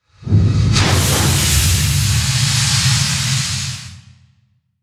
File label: Processed Air, Scifi, Discharge; Science, Fiction; Spacecraft Sound Effects; BlastWave